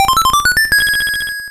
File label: Red Coin Final; SMBDX